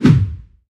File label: wind sweep heavy; mono